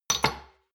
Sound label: shot glasses stack medium; soundpool